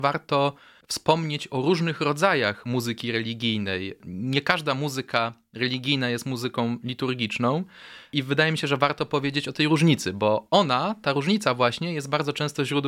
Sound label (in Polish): organy